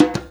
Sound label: DJEMBE, Percussion; InVision Interactive; Percussion Slam